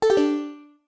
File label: Save Menu Close